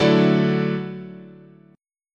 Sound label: D Major Add 9th, chord, guitar